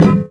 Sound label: SHIELD; gorge